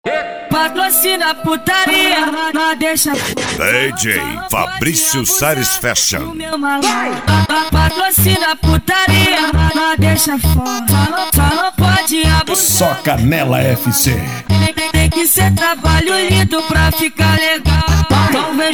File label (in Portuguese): funk e lambadao